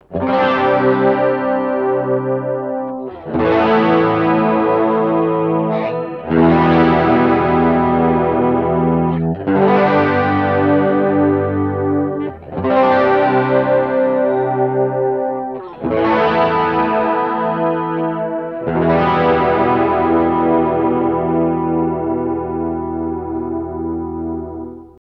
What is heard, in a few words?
Chorus light Overdrive